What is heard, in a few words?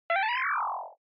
Motion Slide Slow Low